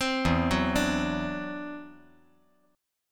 E 7th Suspended 2nd Sharp 5th, E, down

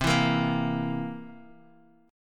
C 7th C, Guitar DADGAD, chord

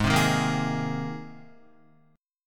Ab Major Add 9th Ab, Guitar; strummed; 3,3,3,3,3,4; 4F,4A,5C,5G,5C,6E

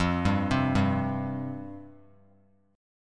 F Suspended 2nd F Bass Standard; Fsus2 arpeggiated; JGuitar

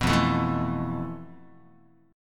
F Major 7th Flat 5th Guitar; strummed

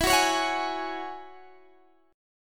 F sharp 7th Suspended 2nd E

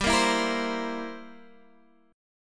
C 7th G

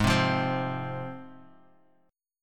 G sharp 6th; 1,0,1,3; down; 4G,5C,5E,5A; chord shape

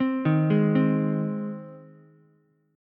Ab Major Ab down Ukulele; arpeggiated; JGuitar

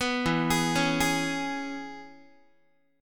E 6th, down, Guitar; arpeggiated; JGuitar

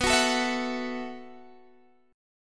B 7th Suspended 4th B down Guitar Half Step Down

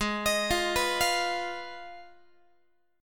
G sharp Minor 6th G sharp down Guitar; G#m6 arpeggiated; x,16,18,15,15,16

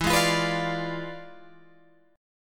E 7th Flat 9th Guitar Open G, chord

